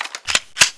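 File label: SPAS12 bolt back, weapons; csof; G MZC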